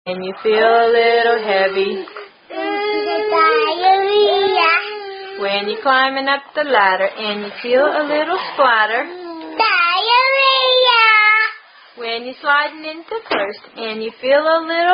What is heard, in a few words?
Diarrhea Song